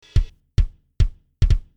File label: interpretive drums